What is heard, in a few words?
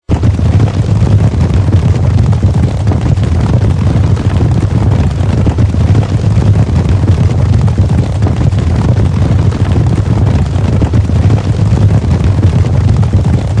Cavalry